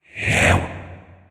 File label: Vocal Attack; Warframe; PriestQuest; PossessedRedVeil